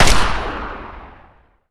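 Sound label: weapon rifle assault fire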